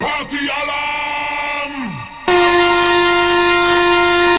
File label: party alarm loud